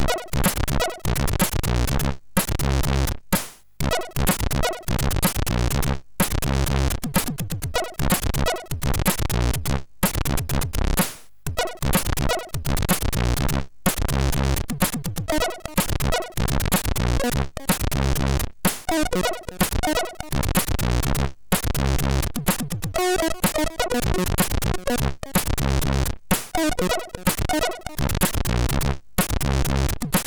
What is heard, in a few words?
One Channel Music, MOS6581R3